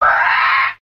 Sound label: raptor call